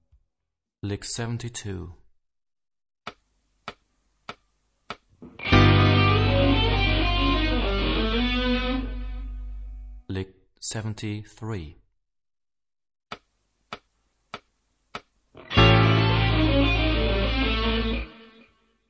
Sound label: Rock guitar; Rock gt Secrets